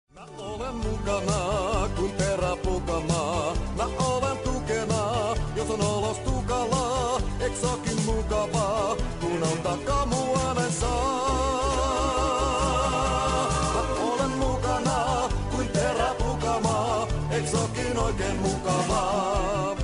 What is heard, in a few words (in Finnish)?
polkka